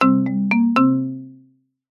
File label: multimedia alert notification musical short marimba completed finished; zapsplat